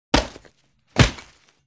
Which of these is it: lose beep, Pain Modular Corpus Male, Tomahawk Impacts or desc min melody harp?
Tomahawk Impacts